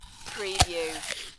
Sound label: Eating, Bite, Munch, Crunch, Person Bites Into Apple